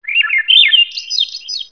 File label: birds chirping sms; ringtones